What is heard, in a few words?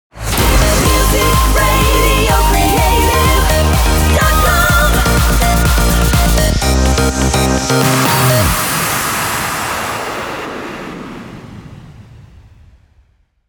Outro